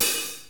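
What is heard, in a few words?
hi-hat open; DRUMS; cubase; CUBASIS; KIT3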